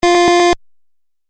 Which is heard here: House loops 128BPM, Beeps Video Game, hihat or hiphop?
Beeps Video Game